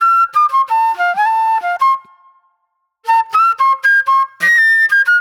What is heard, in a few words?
J-Funk Flute, Call And Response